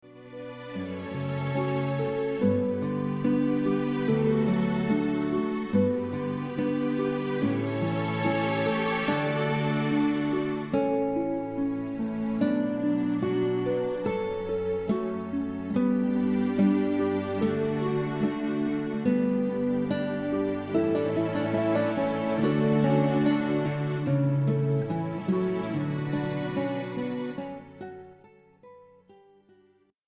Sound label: wedding canon in d, guitar